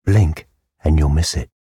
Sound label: blink